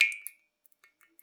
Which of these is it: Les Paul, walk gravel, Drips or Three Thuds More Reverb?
Drips